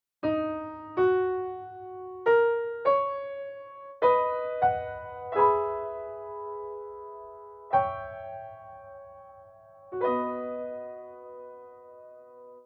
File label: Piano